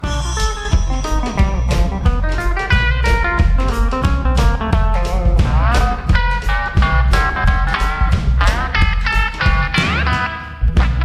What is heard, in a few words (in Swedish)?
musiken snabb